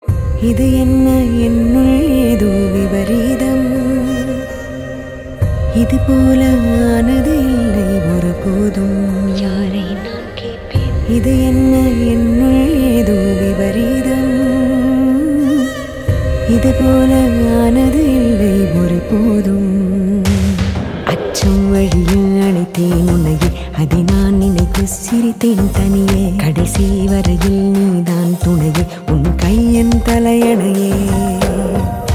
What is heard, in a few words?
Starting Ringtone